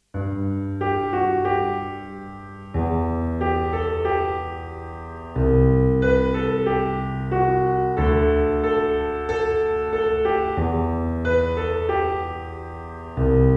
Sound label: piano pop